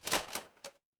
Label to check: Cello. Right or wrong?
Wrong